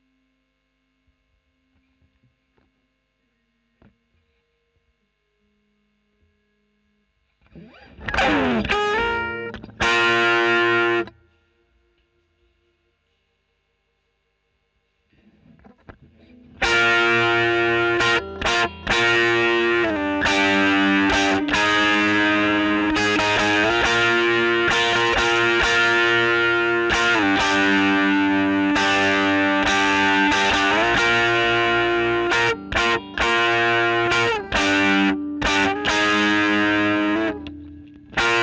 E.GTR Royer OD

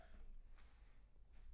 Bass Room

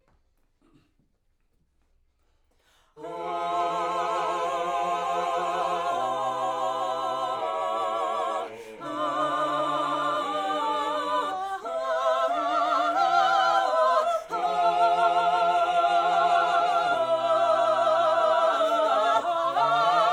Backs; SCHOEPS

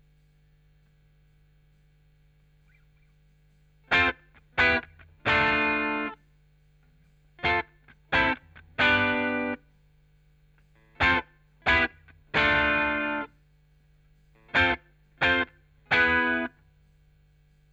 Chorus Gtr

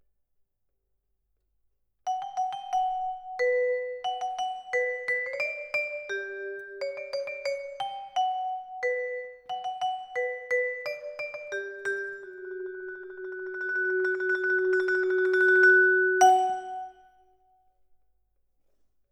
Vibra, Twin F

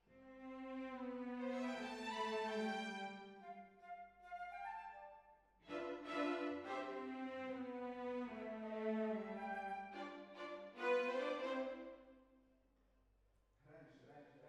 Hall

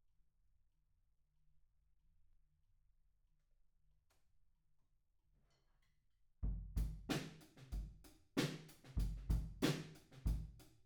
Room, 94bpm

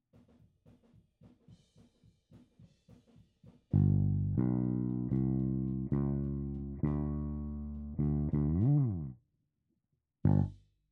Bass RE.12

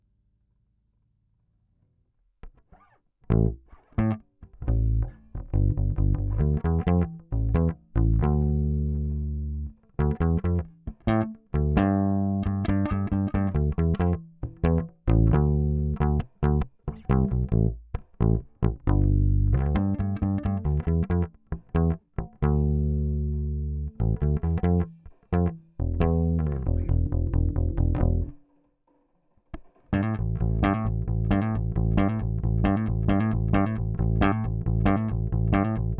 Bass DI

